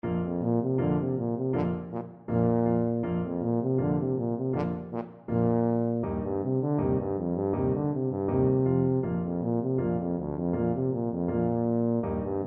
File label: tchaikovsky 1812, tuba